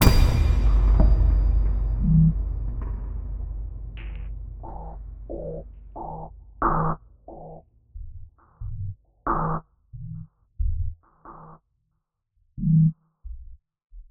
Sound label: Bumpy Bang, Cinematic Metal Impacts